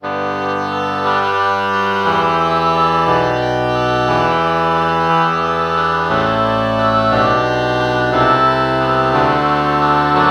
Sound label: oboe oboe morph